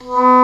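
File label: English Horn p B2; Multisamples; Digital Sound Factory Virtuoso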